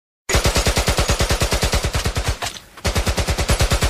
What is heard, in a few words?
Bullets